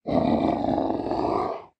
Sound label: Bear Warning; anemoi